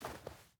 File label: footstep boots snow walk; Rust